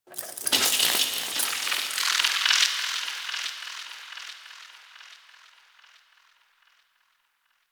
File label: Ship Metal Movement Texture Small, Ambience; Warframe; Corpus Ship Remaster